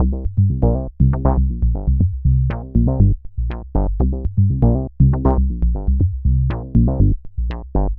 Bassline, Prototechno; Sonic Foundry (Sony Creative Software); Basses

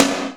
GATED SNARE, Hip Hop Kit; E-MU Formula 4000 Series Vol. 1; Hip Hop Nation

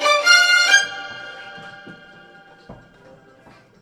STRG; USB Soundscan vol.32; Roots Disco; AKAI

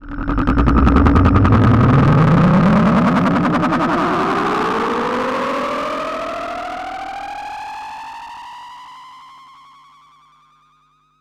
grunge rise; One Shot FX; Sample Magic; Transmission-X

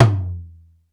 BEAT KITS, T; Crypton - DrumBase5000

